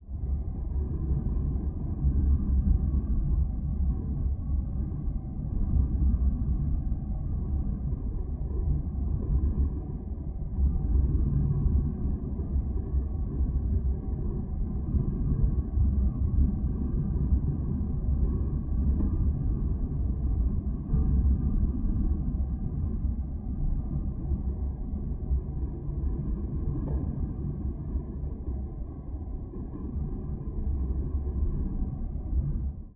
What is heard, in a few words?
Ambient - Crypt